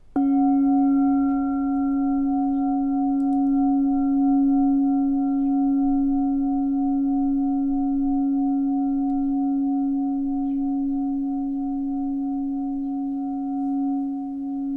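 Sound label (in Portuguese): C#4, quartzo; instrumentos de quartzo; TACA 7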